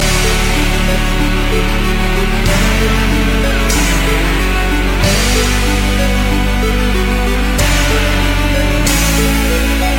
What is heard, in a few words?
Mini Loop